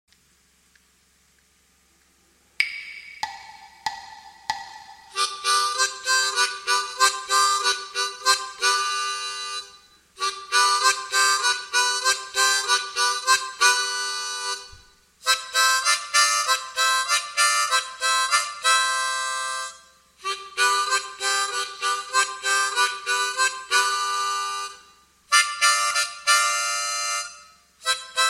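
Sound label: blues lick, 95bpm, harmonica; gaita diatônica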